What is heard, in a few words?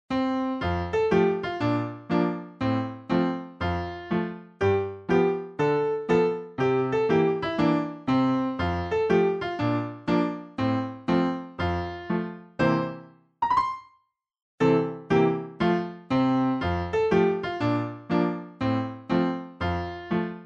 instr; Baby Bumblebee